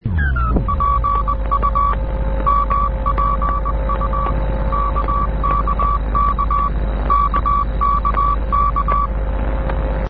raf malta cw

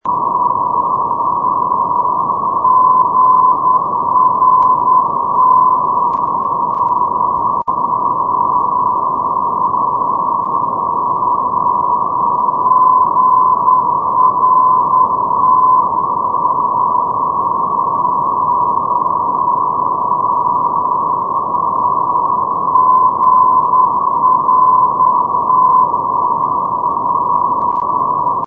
ndb grottaglie grt 331 sdr

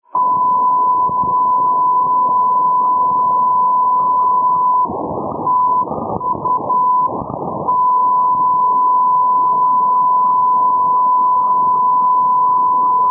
ndb tuzla; tu-445; europe

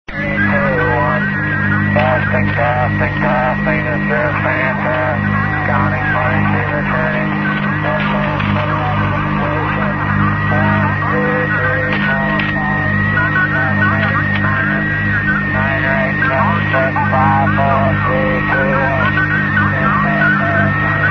usb live, utility radio stations

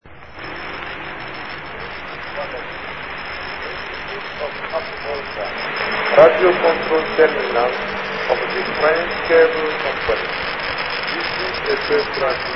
usb, utility radio stations

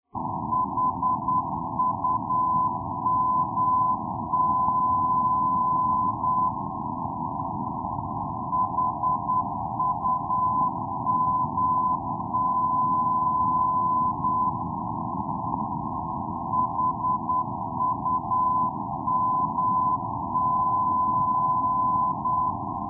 ndb humac brac

